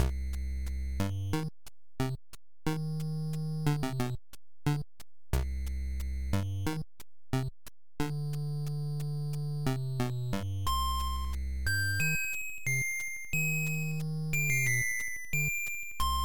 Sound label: Ghost House; SMM2; SMB